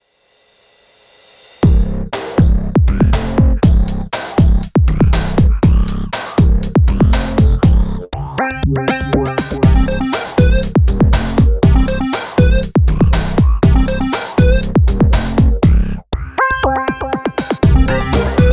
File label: Electronic, EDM